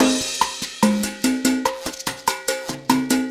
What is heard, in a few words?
Perc mix, acoustic percussion loop; Drums & Percussion; Percussion Loops